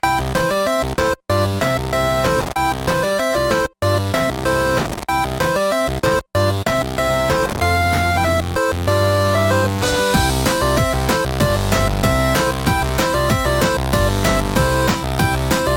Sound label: Chiptune